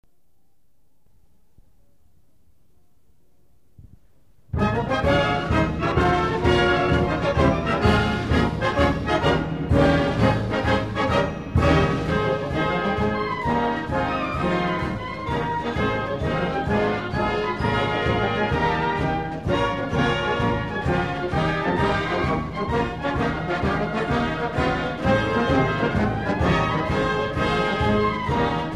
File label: March; NOAA